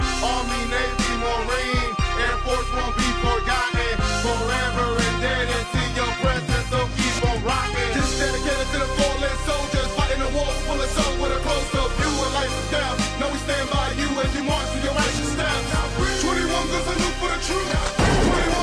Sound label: 21 gun salute